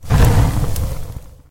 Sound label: dragon fire